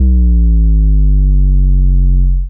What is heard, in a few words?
808 slide